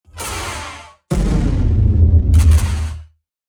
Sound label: Fire Gem